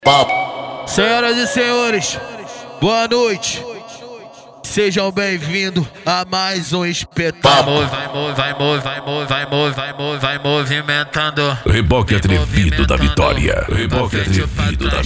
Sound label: funk mega bass